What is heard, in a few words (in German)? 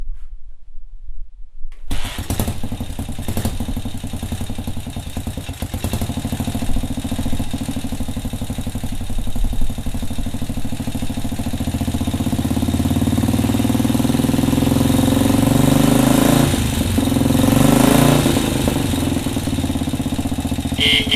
BMW R23 Soundcheck; techdata